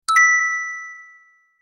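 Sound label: bell ding alert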